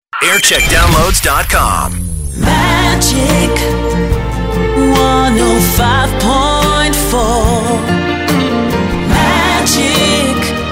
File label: jingles; magic